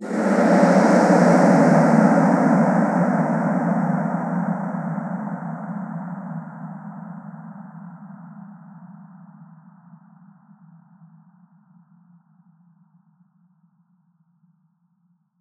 Atonal Depths, Psytrance

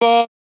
Dry One Shot - A#, Vocal; Tonal One Shots; Cymatics; Euphoria Vocal Sample Pack

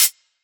Close Hi Hat, dubstep; Ghosthack; Demolition; Free Dubstep Samples; Drum Shots; Venomous

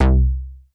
BASS Engulf (C); Melodic One Shots

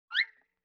Violin Short Pitch Slide

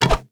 Attack Bow; Game Essentials

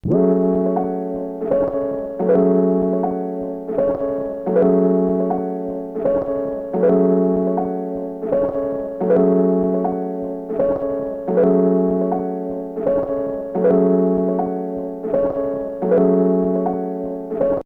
Tape Loop Melody, F#; Tape Loops; Melodies; Cymatics - Eternity Sample Pack

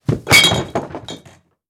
BF Onto Table Bottle Clan; Fairlight Sound Lib

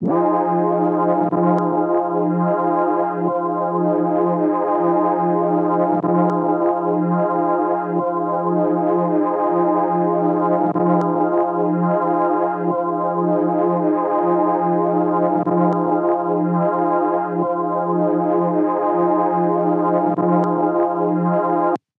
Tape Loop Pad, F Min 7; Pads; Cymatics - Eternity Sample Pack

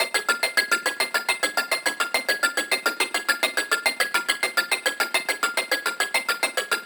Riddim Melodic Loop F 140 Clicker; Ghosthack; Riddim Essentials Volume 2

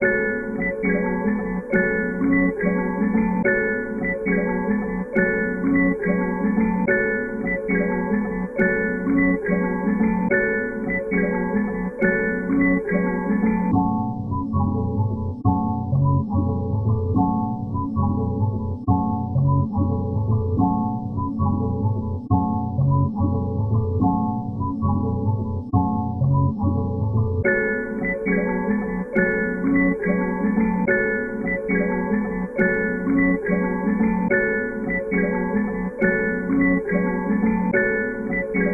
140 BPM F# Min Bells; Melody; Trap; Loop Stems; Charisma; Cymatics; 2022 Melody Collection

